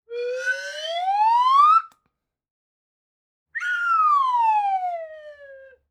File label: Airborne Rising and Falling, Slapstick Comedy; Coll Anderson; GDC Game Audio Bundle